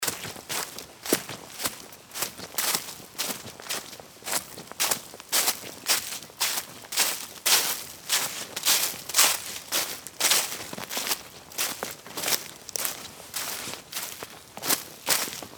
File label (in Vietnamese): tieng buoc chan cua nguoi di rung